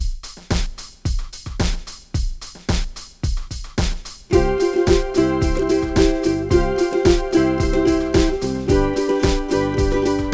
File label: Uke, no melody